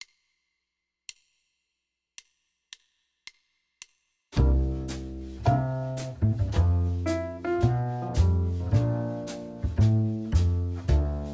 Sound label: 251 play along